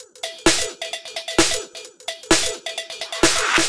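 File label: 130 Tinny Break No Bass Drum, Drum Loops; Zero-G; Soundclash Nu Dance Electro; Acidized Wavs; 130bpm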